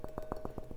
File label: Kitchen